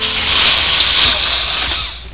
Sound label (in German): Buegel